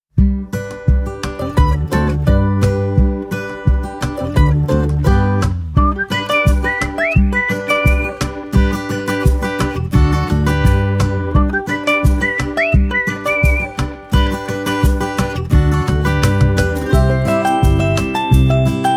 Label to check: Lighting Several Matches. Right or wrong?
Wrong